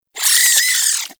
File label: Vocal Death, Hunting Critters; Nexifera